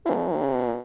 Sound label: short bark